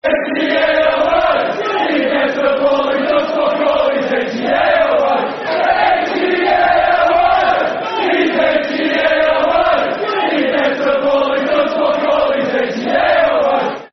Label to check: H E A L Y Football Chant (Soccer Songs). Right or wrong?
Right